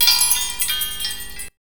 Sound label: CHIMES